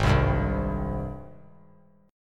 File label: Ab Major 7th Ab down 3C 3F 3A 3D; AbM7 strummed; JGuitar